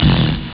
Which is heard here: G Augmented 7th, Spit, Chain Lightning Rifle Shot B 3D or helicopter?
Spit